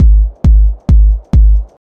bouncy reso techno kick loop; drum loops

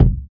phat bass drum sample c sharp key; kick drum samples

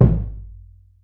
strong bottom end kickdrum sound d key; Channels Mono

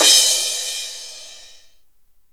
long cymbal sound sample e key

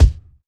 bass drum sound e key; tuned drums e key

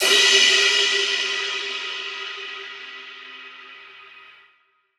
crash f sharp key; Cymbal drum one shot samples

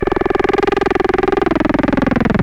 monodelay fm 105, synth; loop

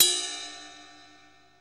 ride sound sample b key

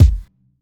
ambient bass drum e key; kick drum samples

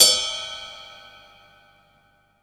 long tail reverb drum ride sound c sharp key

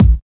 low tone kickdrum a sharp key

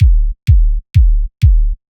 tight sub kick with reverb; drum loops

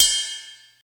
long room reverb ride sound G key; cymbal drum

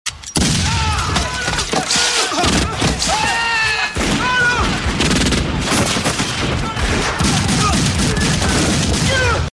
repetitive gunfire and screams

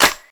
old school clap one shot c sharp key

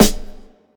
Short Reverb Snare One Shot D Key; snare drum samples

